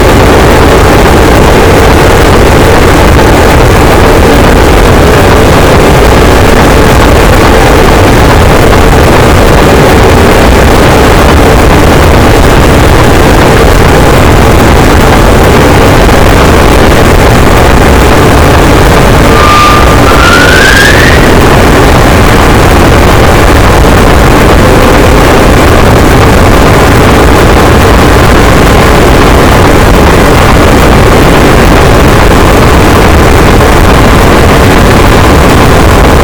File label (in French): cachalot negatif, boat, Physeter Macrocephalus